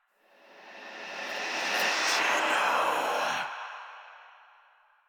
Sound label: Cave Whispers; Ambience; Orokin; Warframe; Stalker Cave